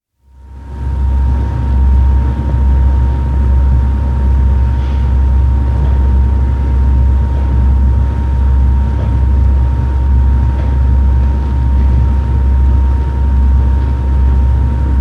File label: Internal atmos Ship's fan; World War II; From 78 rpm disc; Boat; BBC